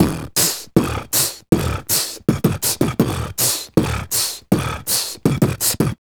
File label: Beat Box, Hip-Hop and RnB Vocals